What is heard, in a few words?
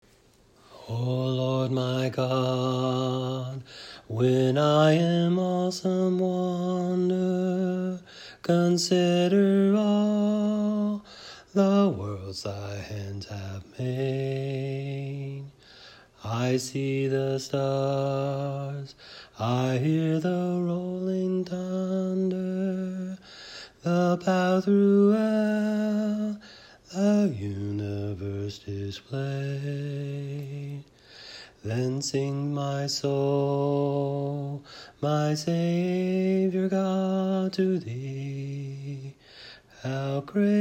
How Great Thou Art BASS